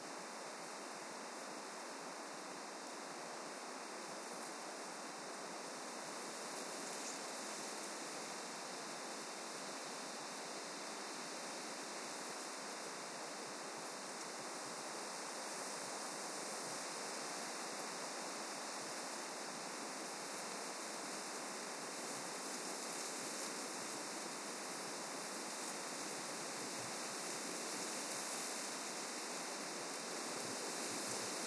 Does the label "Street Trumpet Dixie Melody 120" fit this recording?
No